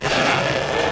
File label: Stretch Twist, Foley Creaks; SFX Kit